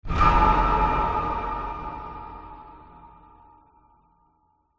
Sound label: cinematic hit horror fear long reverb; sound design